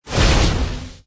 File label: attack